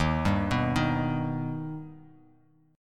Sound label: D sharp Augmented D sharp Bass Standard; D#+ arpeggiated; 11,10,9,8; JGuitar